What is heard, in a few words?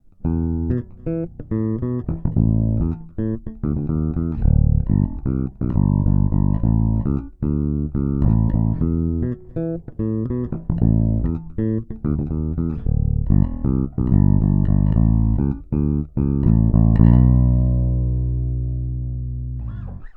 Both passive